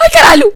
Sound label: hit, damage; LumiCu 2.0; minecraft